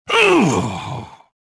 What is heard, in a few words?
combat; reborn